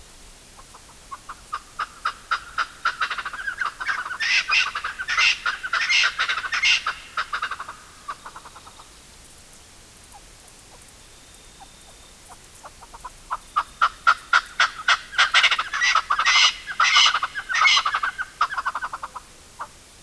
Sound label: Numida meliagris flock